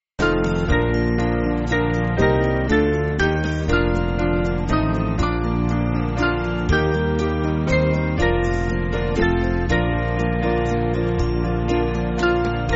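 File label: Band, Backing Files for Churches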